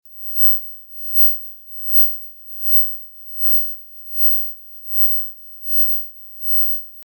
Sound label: powpow, mosquito ringtones